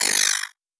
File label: electric low buzzer